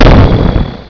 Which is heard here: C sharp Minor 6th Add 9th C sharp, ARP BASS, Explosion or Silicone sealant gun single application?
Explosion